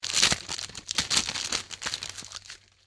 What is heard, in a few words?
medkit; STALKER